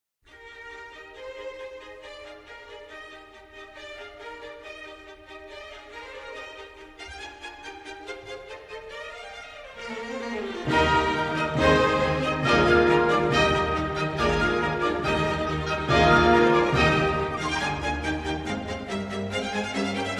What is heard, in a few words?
Trumpet, Trumpet Concerto in E flat major; Haydn; Trumpet Concerto in E flat major, H. 7e No.1: 3. Finale. Allegro